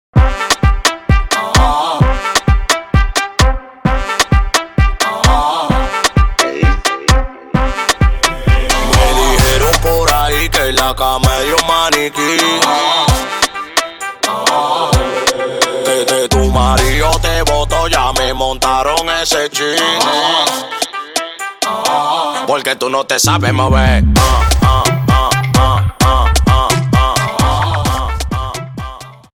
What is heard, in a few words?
DEMBOW INTRO BPM 130